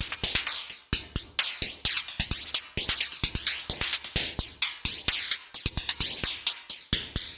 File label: 130 Perc Analog Kit; Zero-G; Future Wave